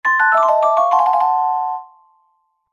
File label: Fanfare; "Get a Rupoor" fanfare (Recorded); zelda sounds; Skyward Sword